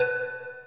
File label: Arp; osu